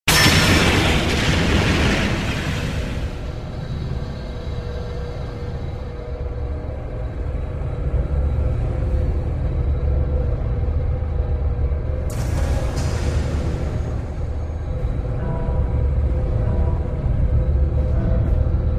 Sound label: Amb Bunker Int